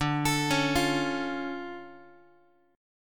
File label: D Minor Major 7th D down Guitar; x,10,12,11,9,x; DmM7 arpeggiated; JGuitar